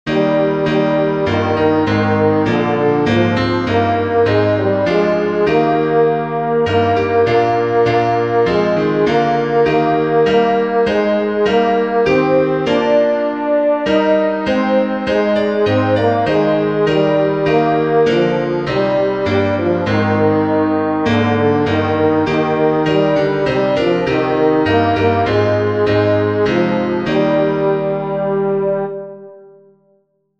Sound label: slane tenor; “Lord of All Hopefulness”